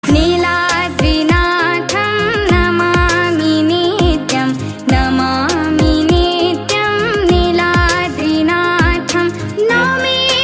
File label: ODIA BHAJAN RINGTONE